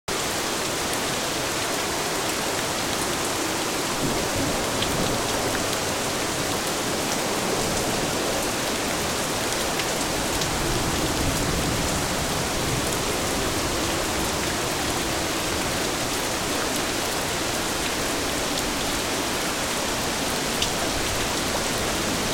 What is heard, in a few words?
Rain ambience; Angry Birds Time Travel; android gamerip 2019